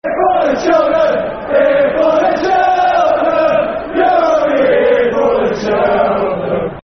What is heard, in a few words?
Football Chant; Here For The Cheltenham; Cheltenham Town Ctfc